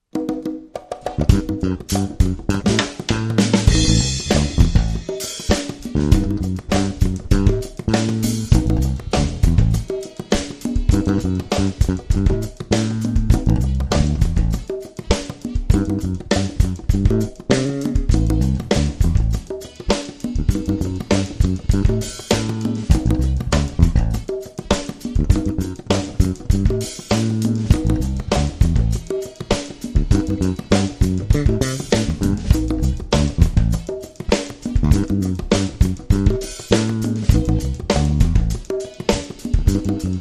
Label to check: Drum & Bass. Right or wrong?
Right